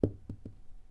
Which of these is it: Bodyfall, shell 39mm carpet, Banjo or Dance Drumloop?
shell 39mm carpet